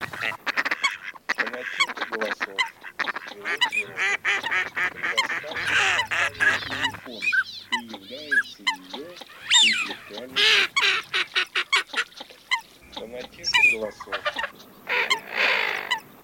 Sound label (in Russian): Утиный базар Anatre mix